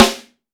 MAPLE SNARE

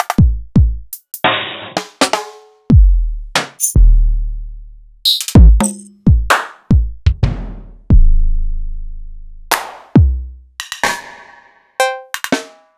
EMU Orbit

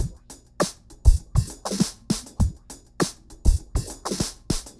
Remixed Drums 100BPM; The Roots Drumloops

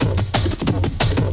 LOOP -L, Drum & Bass, 180 BPM

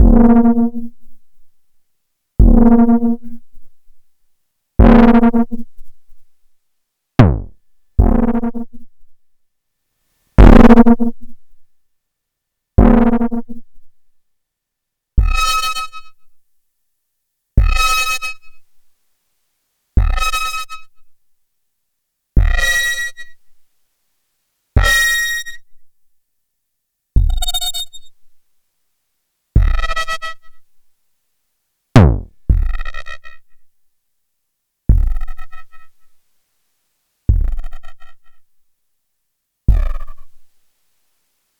Kick Distorted Feedback; Sliced

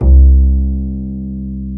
Bass, Roland

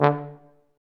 F TUBA, Solo Brass, Tuba stacc

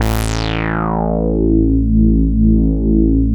Prophet 5 A2; Hollywood Edge - Giorgio Moroder Rare Synthesizer Collection

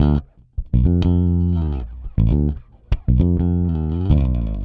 Rock, Bass; Bass X

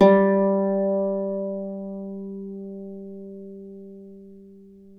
Celtic Harp

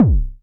BD, Bassdrums, Roland TD-20

